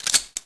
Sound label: usp slide release; cstrike; diliulsound